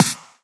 Snares & Claps; Vengeance Samples

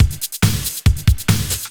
Breakbeat; Vengeance Samples; VEC2 Loops

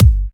Kick

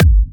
Hard Kick; Vengeance Samples

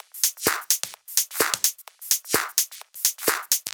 Drumloops 128BPM

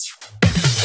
Loops Fills; Vengeance Samples

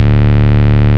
Bass D, Root D

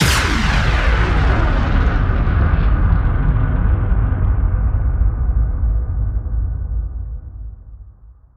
Downlifter

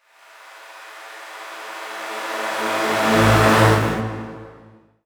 Tonal FX A

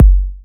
Bassdrums; Vengeance Samples

